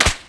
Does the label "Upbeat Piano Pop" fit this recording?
No